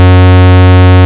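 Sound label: Ob Saw Square, Synths; Multisamples; Ableton Live 12 Lite; Core Library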